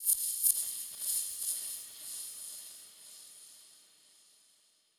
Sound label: Wet Percussion (Crystal)